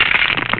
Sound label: SHUFFLE; special effects